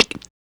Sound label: PERC